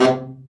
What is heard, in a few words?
BRA BARI, Bariton Sax